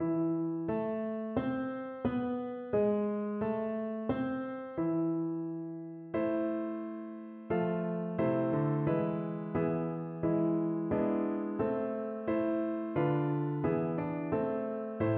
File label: bethlehems manger, piano